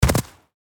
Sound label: Footsteps on snow boots single step